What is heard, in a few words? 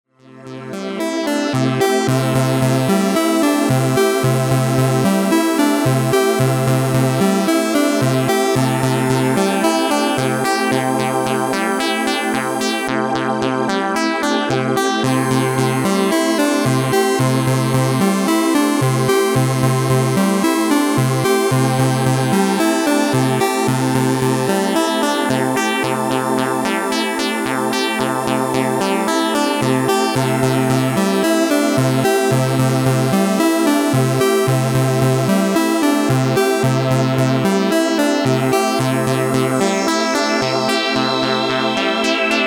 Yamaha SK30 seq